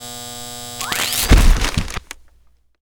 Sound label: medical defibrillator